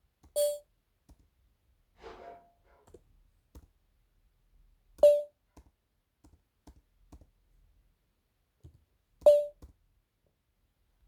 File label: noisy sound notification